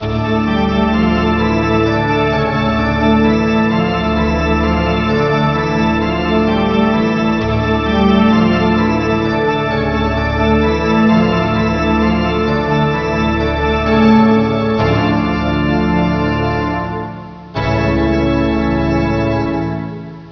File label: organ; de commence